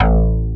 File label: FM-7II